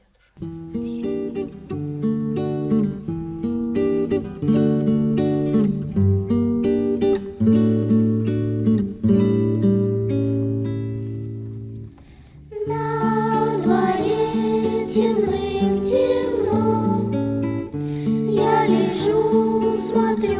vokalnyy ansambl gitara; Yu. Moric

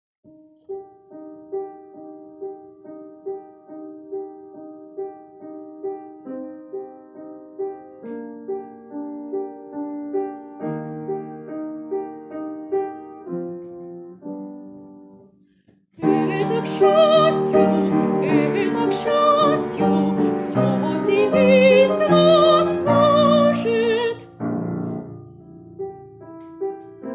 Papa vazu oprokinul soprano

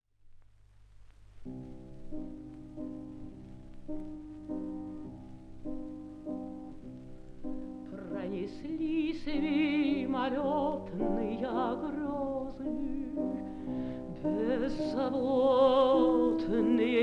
mecco soprano fortepiano

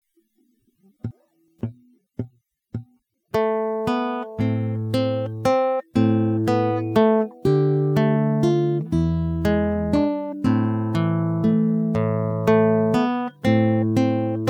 klassicheskaya gitara

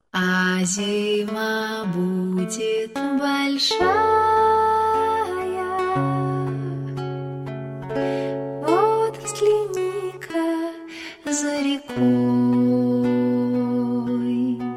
gitara

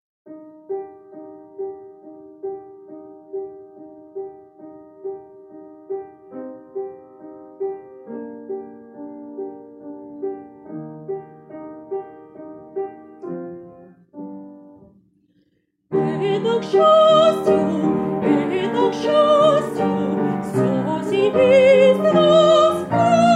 Papa vazu oprokinul soprano

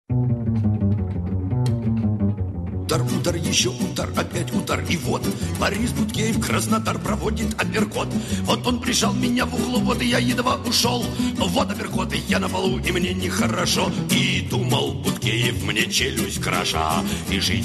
Pesnya

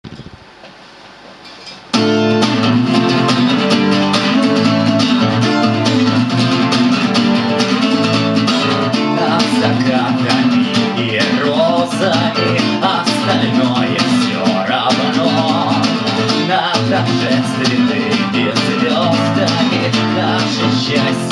Nad zakatami i rozami gitara version; G. Ivanov

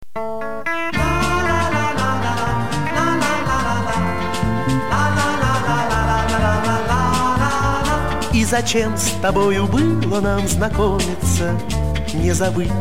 gitary; sl.I.Goff

